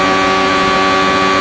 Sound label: Suz Hi Lp, Engines; SuperBike2001